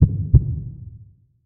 Heartbeat

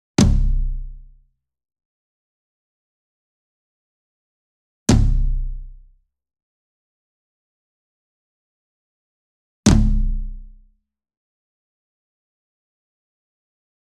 ENSEMBLE DRUM Concert Toms Hit Near; boom library; Cinematic Strikes Construction Kit

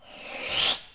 door close; Apotheorasis

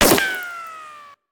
Bullet Ricochet; Sound Ideas; Guns

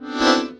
Cartoon Accordion Squeeze; SFX Kit; Foley - Misc

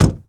Truck Durango Door Close; Trucks

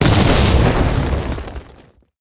Explo Missile Rock Wall; Destruction

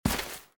grass; firefight

